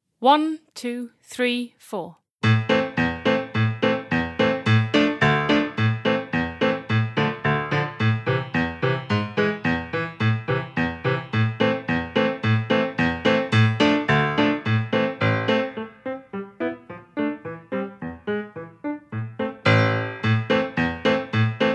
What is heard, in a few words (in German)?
Ungarischer Tanz Playalong